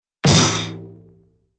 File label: drum and cymbal impact